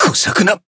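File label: damaged, Japanese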